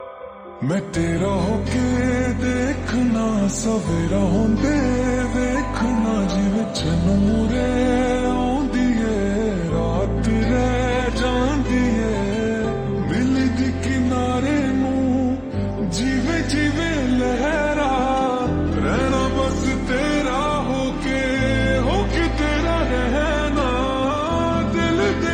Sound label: Slow Reverb Version Ringtone